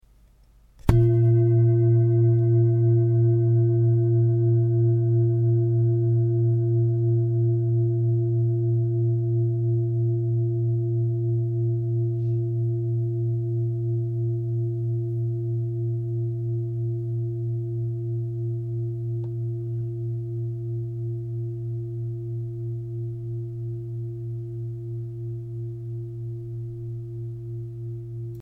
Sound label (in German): Klangschale